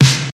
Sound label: eighties original punk acoustic snare sample c key